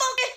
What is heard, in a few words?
Funky, Yamaha PTX8